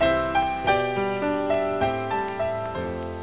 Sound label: piano